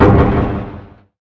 wall

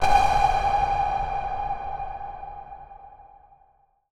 airstrike; icbm

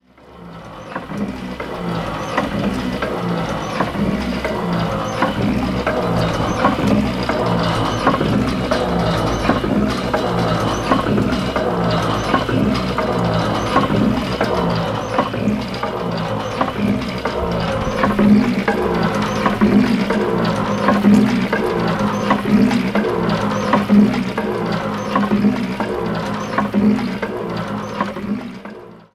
Compressor; Mekanism tilera Edition

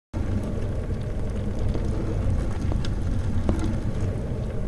Chemical Washer; Mekanism tilera Edition

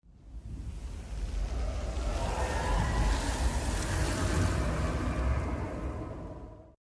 snap; infinity-craft

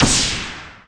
destruct; equivalent-exchange-3

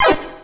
sound shoot; godot; space shooter

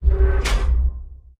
stone close; thaummach

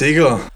hurt; spackenmobs; apored

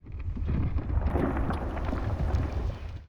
collapse; icbm

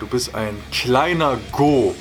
hurt; spackenmobs; apored

